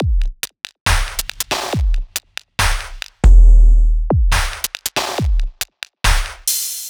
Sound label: Lomo Break, Bpm 139, Dubstep, Drum Loops